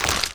skeleton big hit; wesnoth

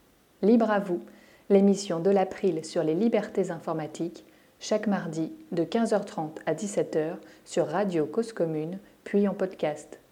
jingle

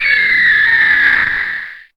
gryphon die; wesnoth

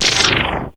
magic dark big miss; wesnoth

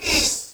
hiss; wesnoth

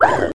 mermaid hit; wesnoth

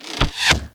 bow; wesnoth

